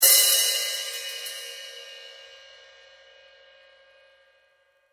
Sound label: crash